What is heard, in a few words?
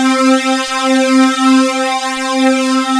THICK SYN